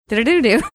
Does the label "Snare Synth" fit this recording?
No